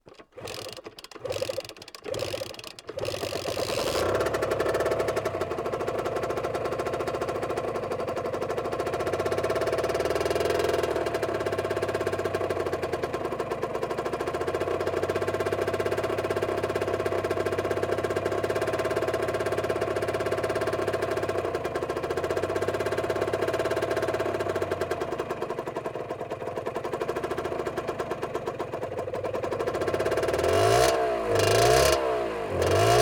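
Keeway Exterior Start Idle Warming Up Drive Away, Keeway TX50 Moped; Soundreorganized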